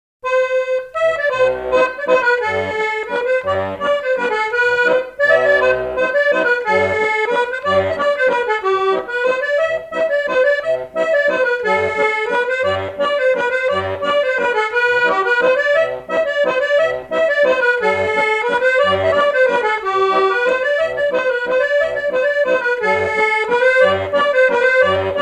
Mazurka

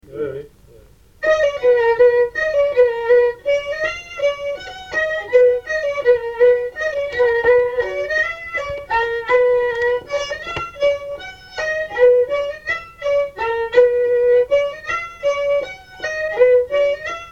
mazurka; Casse noisettes

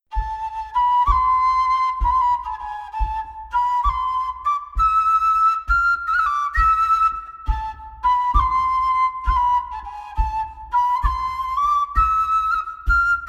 Montagnarde; Lefebure Wely

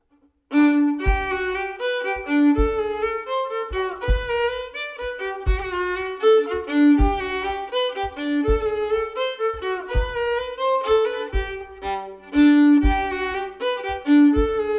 Valse

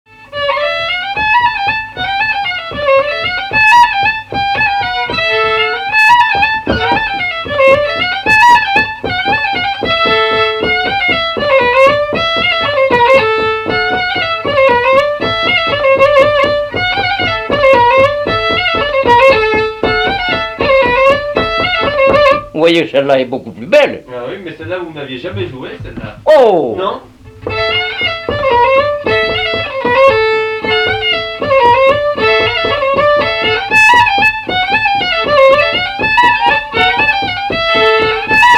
Bourrée; Bourrée la beaucoup plus belle

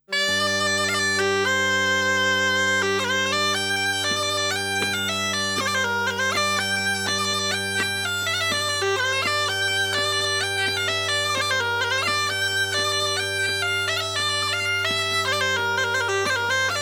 Bourree; Bourree de Magadou

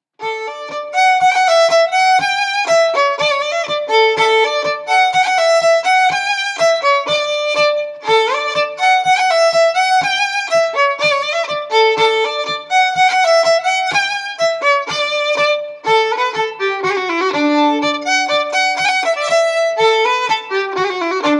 Bourree

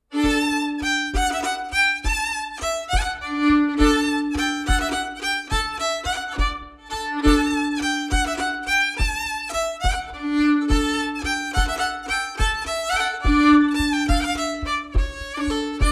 Bourree d Auvergne

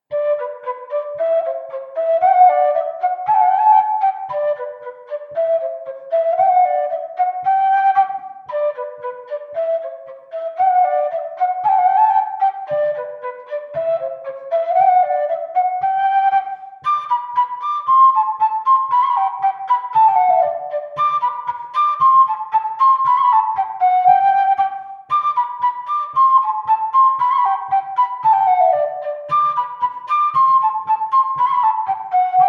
Bourree; Recueil De Raoulx; version corrigee

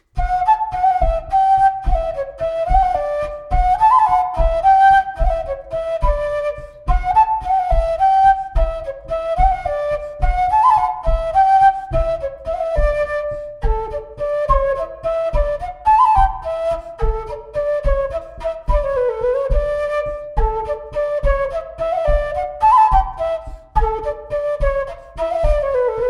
Montagnarde; Recueil De Raoulx

